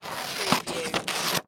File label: Scissors Cut Paper; sound effects bible